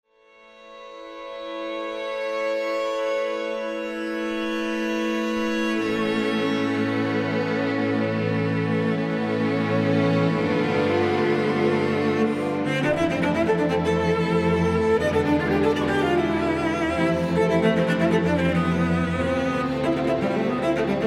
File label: Concerto for Cello and Strings; III Radiant